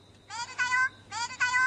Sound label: Message Tone Japan